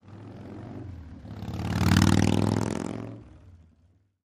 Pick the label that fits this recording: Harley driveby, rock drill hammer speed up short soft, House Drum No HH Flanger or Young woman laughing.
Harley driveby